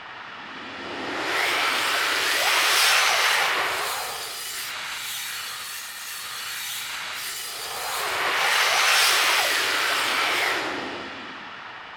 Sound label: ZIPPIN; Distored Reality